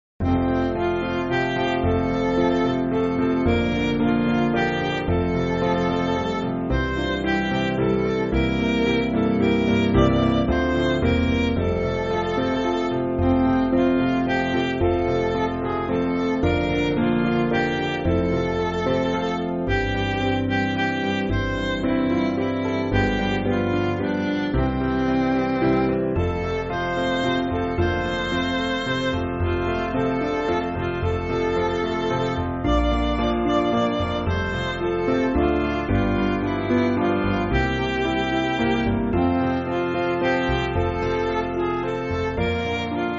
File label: Midi, Music Backing Files for Churches; Kirkpatrick